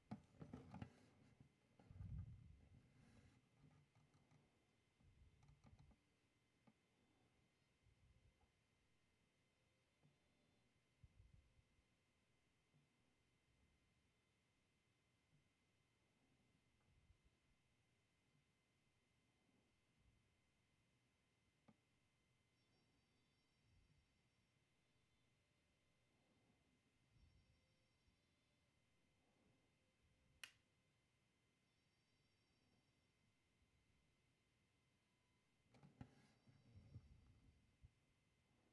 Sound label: Violin; Verdigris Music